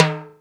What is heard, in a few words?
TIMB LO, TIMBALES; AKAI S6000 CD-ROM - Volume 5